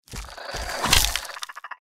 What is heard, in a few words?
mirelurk attack power forward; npc